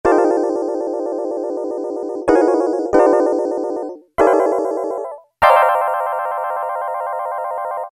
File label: SHIMMER, Yamaha DX7